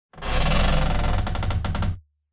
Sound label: Designed cinematic wood textures pressure bends